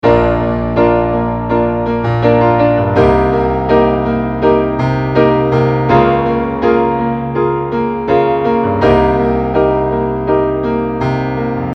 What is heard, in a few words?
Piano